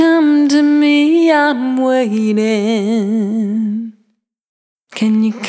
Come to Me, Vocal Samples, Single Voice Melodic Loops; Singles